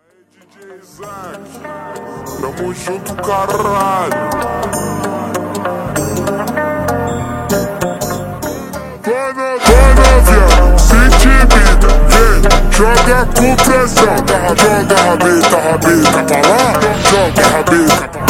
FUNK Super Slowed

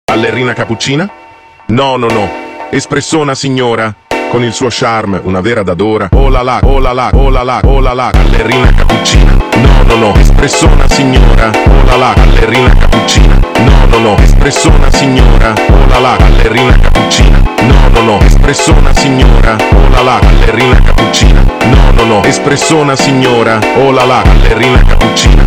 Funk slowed

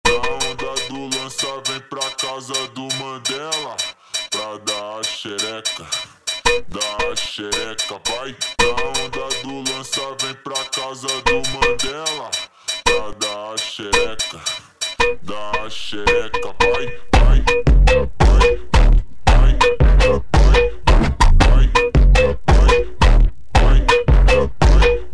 Funk slowed

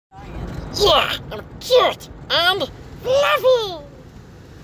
cute fluffy; stitch; Disney

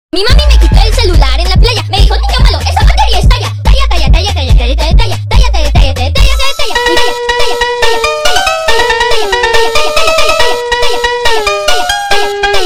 funk bassboost

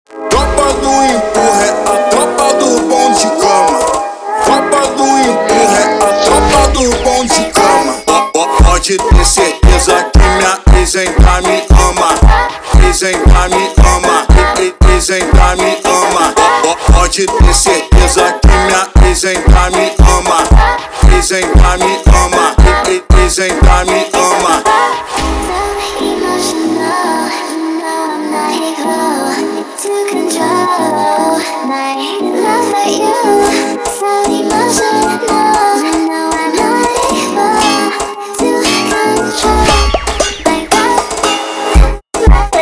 Funk slowed

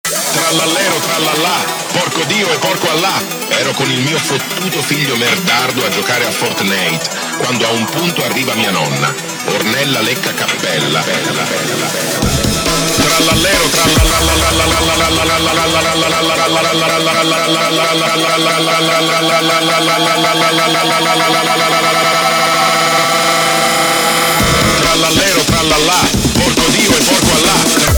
Hyper Techno

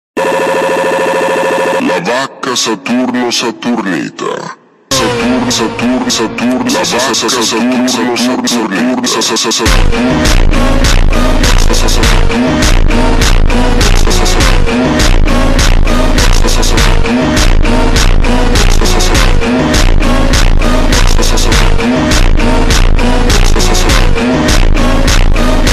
Funk super Slowed

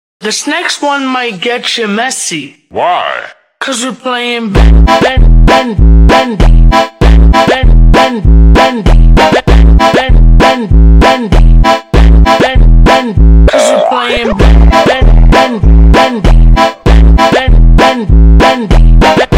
Funk ultra Slowed

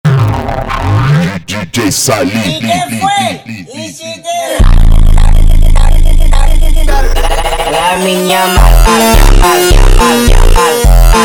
Funk slowed

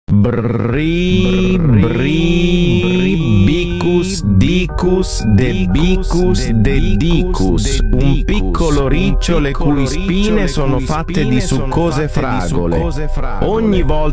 reverb slow

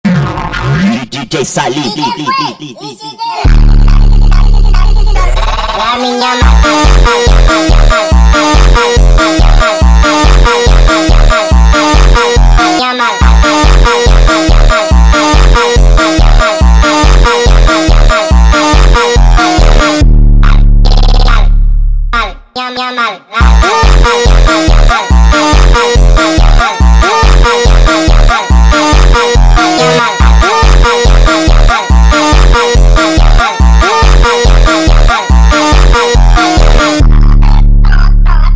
Funk sped Up

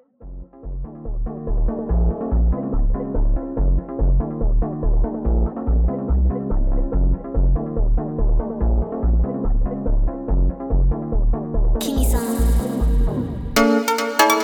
Realmente Funk sped Up

